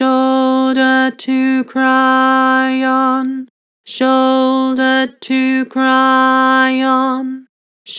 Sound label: Vibrato, Vocals; Shoulder to cry on; Harmor Vocals